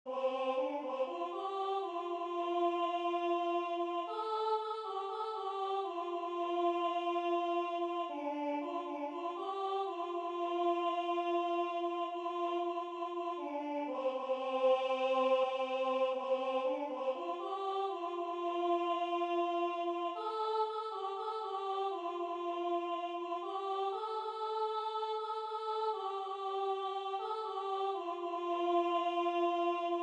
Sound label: This little light of mine SATB